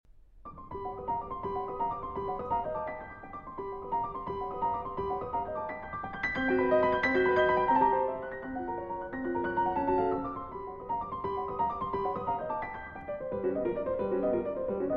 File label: Piano Sonata in E-Flat Minor